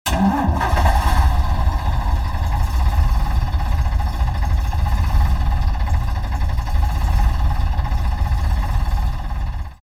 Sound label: start your engine